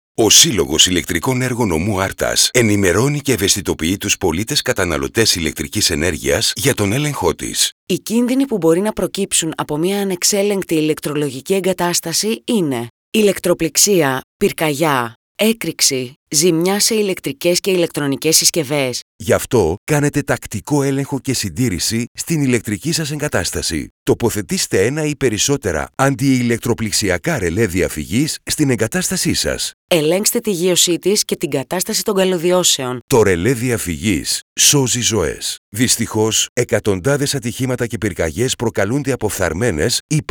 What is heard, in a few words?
Vocals